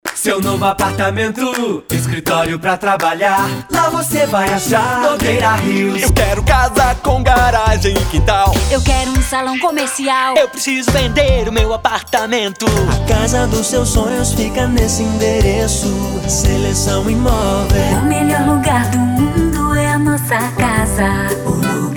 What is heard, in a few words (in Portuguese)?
jingles imobiliarias; mundi imobiliarias